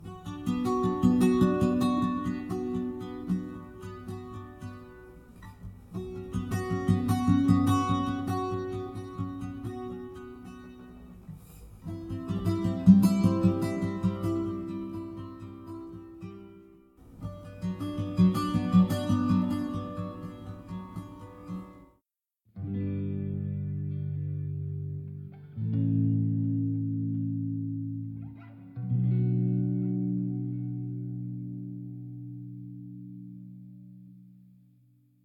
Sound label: Mobile Home - Ambient, Guitar Stem; ps4 ps5 switch windows xbox one xbox series xs